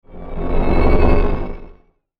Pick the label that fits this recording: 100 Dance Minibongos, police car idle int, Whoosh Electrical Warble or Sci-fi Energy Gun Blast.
Whoosh Electrical Warble